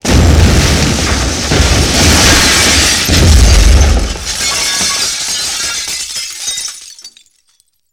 Explosion with glass shattered

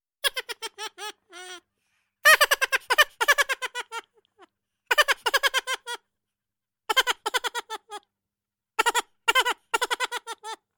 Funny Squeaky Laugh

